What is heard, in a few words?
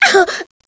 pain; cstrike